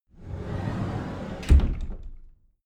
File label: cupboard slide door open regular